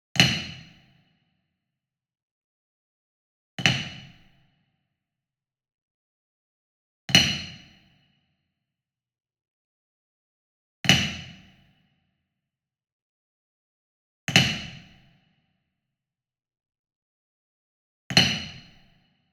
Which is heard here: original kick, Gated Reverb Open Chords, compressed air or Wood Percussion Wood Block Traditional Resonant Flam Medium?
Wood Percussion Wood Block Traditional Resonant Flam Medium